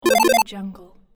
retro 8bit video game power up sound; tony-parsons-music

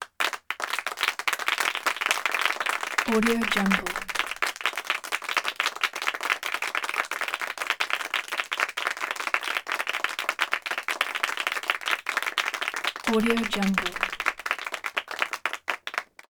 applause; prosoundfx

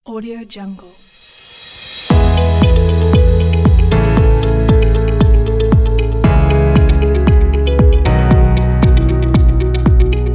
simple business; darkhorsemusic

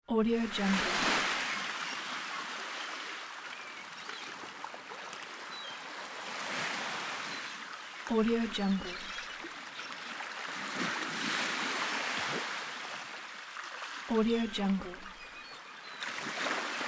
beach ambience

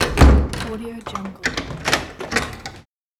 wood door close lock

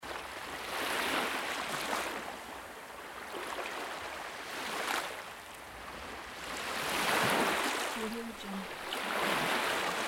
sea waves loop

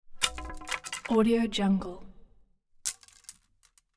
handful of arrows drop down

